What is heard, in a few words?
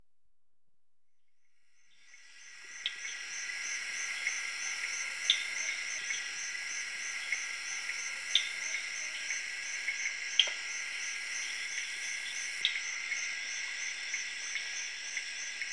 Zaire, Tree Frogs And Crickets, Africa, Natural World; BBC Sound Effects Library